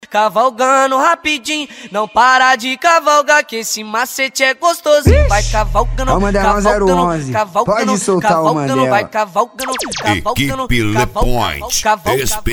deep house e pisadinha

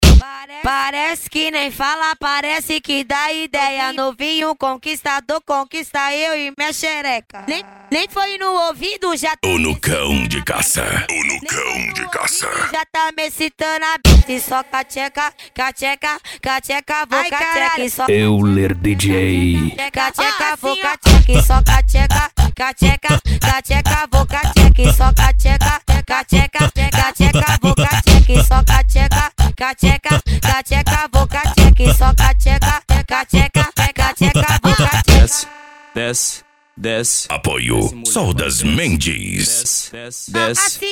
funk ritmado

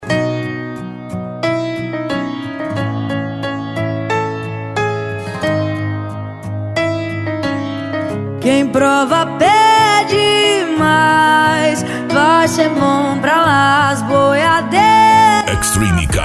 piseiro e sertanejo

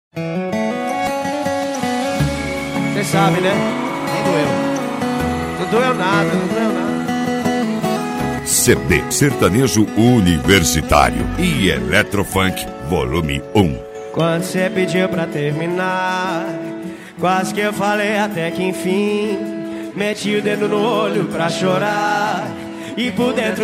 eletro funk e sertanejo

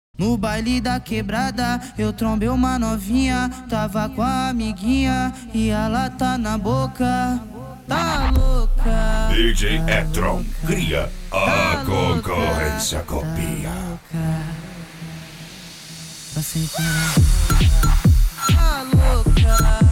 mega funk funk

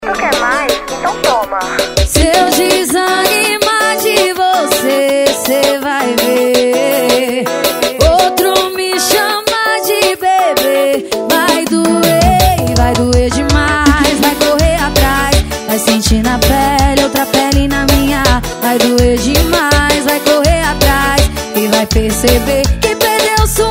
piseiro

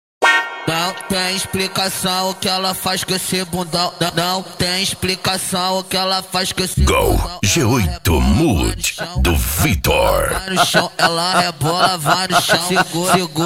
funk ritmado

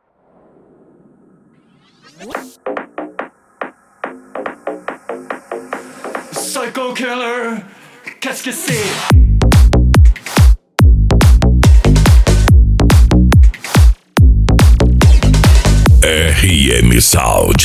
som automotivo

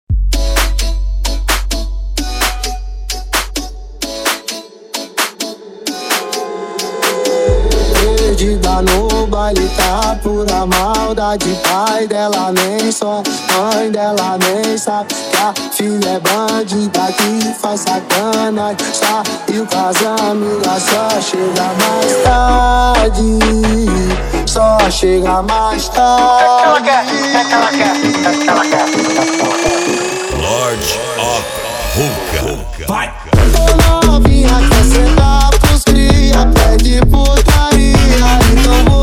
toca o trompete; lord of hookah

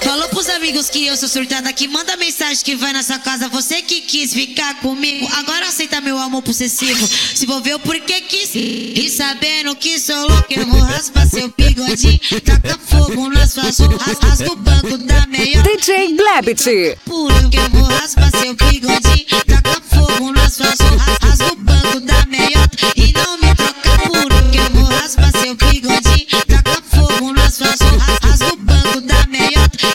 funk sertanejo

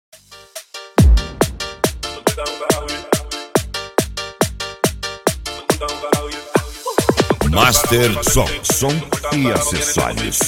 reggaeton

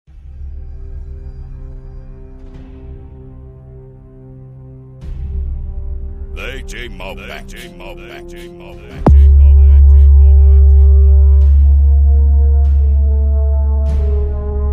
sertanejo

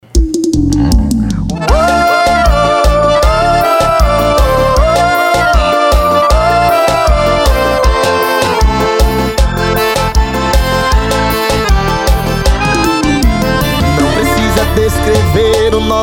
sertanejo

